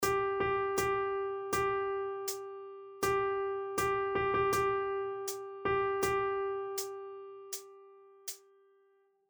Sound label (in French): Binaire Noire